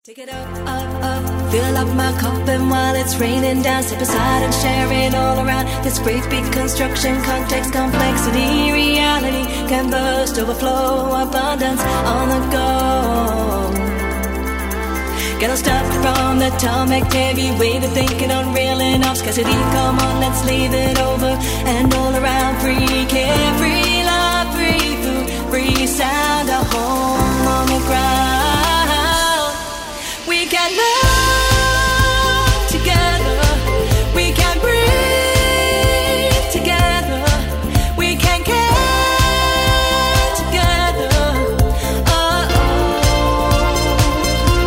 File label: remix